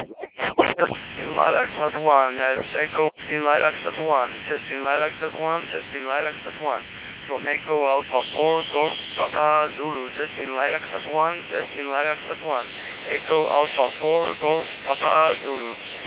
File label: lilacsat audio; lilacsat1